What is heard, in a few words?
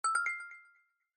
trends updated; TWBlue